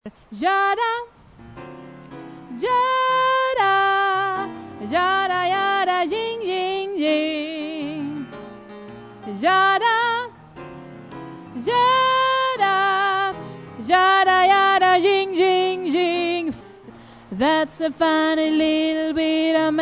alt, stammor

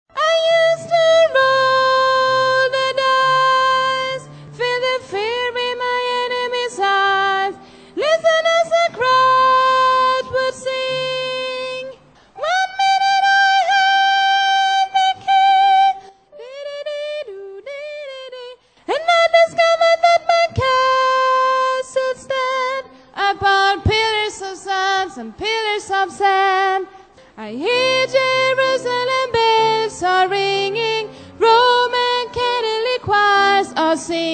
bas

